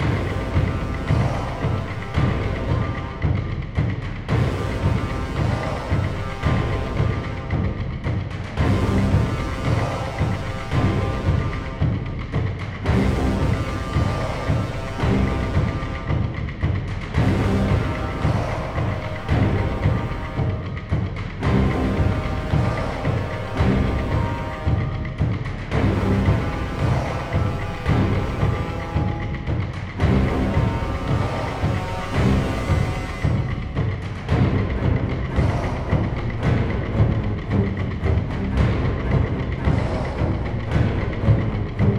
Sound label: Music Fight Vikings Theme Average 112bpm Loop; asterix obelix slap them all 2